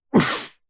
human male man cough single into cloth or arm muffled; zapsplat